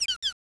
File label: Elect Chirp